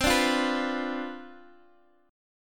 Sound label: C Major 7th Suspended 2nd Suspended 4th C down Guitar DADGAD